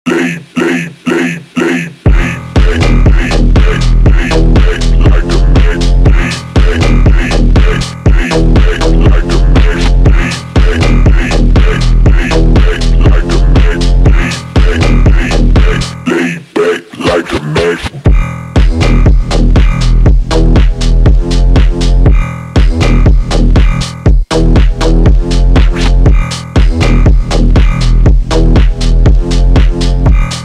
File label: PHONK